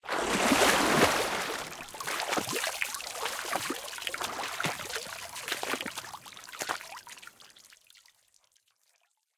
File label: sequence lagoon splash